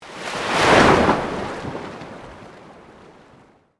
Sweep Organic Slow

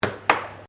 Air Hockey Hit Double, Air Hockey table; Games; SoundEffects-Foundation1

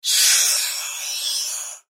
Sci Fi Air Burst Expel Pneumatic Hose Discharge; Blastwave

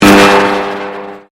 Convolution Pulse

